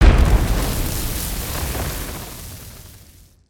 Fire Rail End

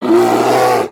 Pets Bear Panda Rage; creatures; Lego

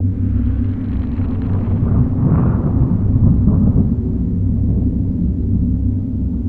Rumble Ambience; Nursery

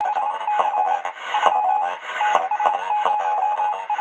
code transmit; avant gardens

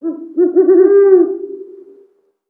Owl Call; Valiant Summoner

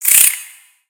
Spider Attack Ranged; creatures mechanical; Lego

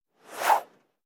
Come Here, creatures pets

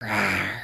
Dragon Rawr; emotes

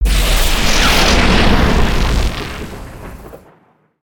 Lightning Tornado; Spinjitsu

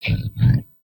Pet Buffalo Idle Look; creatures pets; Lego

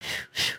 bored blow double; emotes

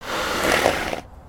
horse idle

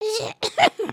indignant, emotes

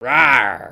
Dragon Emote; emotes

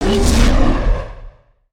Shinobi Roll

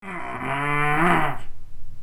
Growl

